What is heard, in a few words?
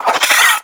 mole miss, water balloon; Birthday Party Bash; Wii